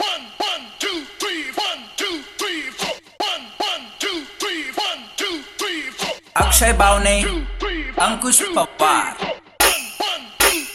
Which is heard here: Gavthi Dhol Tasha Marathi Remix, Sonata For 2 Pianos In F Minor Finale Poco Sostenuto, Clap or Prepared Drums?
Gavthi Dhol Tasha Marathi Remix